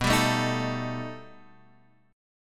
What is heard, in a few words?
B Augmented 7th B down; strummed; JGuitar